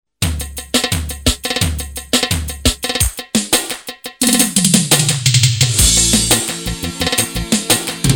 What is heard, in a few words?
Valle Dasmash Turbo; Valle Shqip